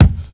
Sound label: studio kick drum f key